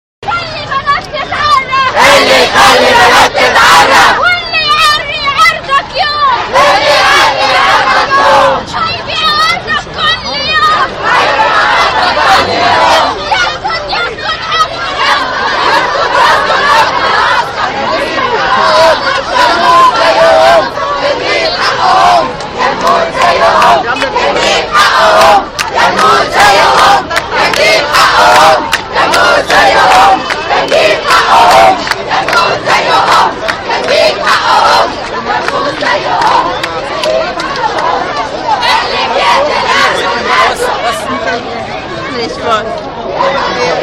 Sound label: women protesters marching and chanting in tahrir